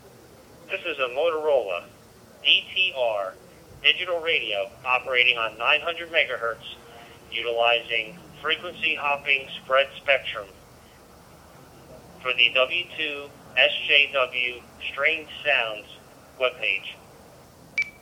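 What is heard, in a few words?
Moto DTR, radio sounds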